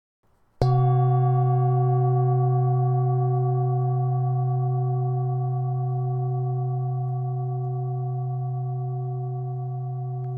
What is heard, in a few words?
Singing Bowls